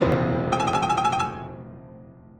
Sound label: Level Begins